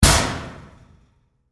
Locker Punch, Sports, Locker, Door, Fist, Punch, Slam, Locker, Room; Miscellaneous Sport